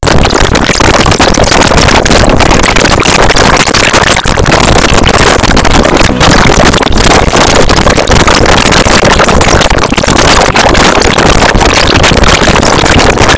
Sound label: music, GSM 22 Khz, mono